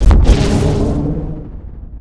advisor blast; Garry's Mod; hl2ep2 content

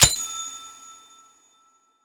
medal click

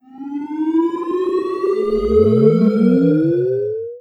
repulsion bomb, explosions; gbombs 5

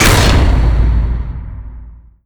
tunnel door closed loud; ambient; Garry's Mod; tf2; rottenburg

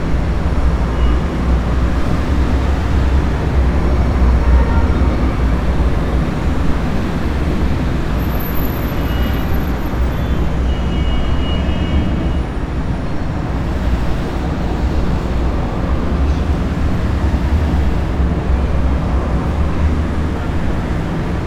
warehouse indoors, ambient; Garry's Mod; tf2; mvm warehouse

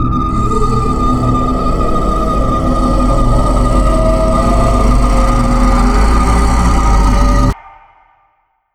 portal hang loop; nihilanth

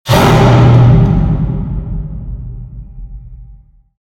furnace shot, ambient, harbor; Garry's Mod; tf2; cp harbor

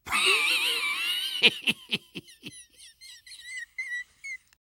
laugh long; soldier

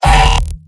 pyro m pain sharp; tf2; garrysmod; mvm

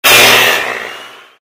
sawblade impact; Garry's Mod; tf2

